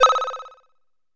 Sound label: Analog Synth G